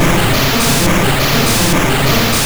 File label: Haze